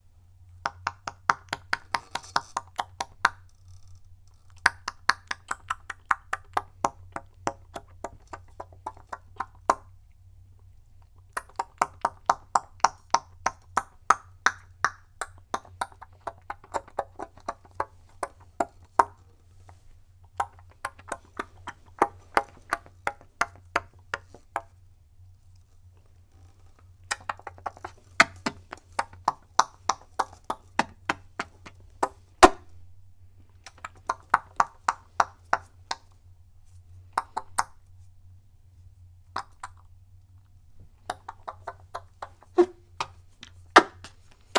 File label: bird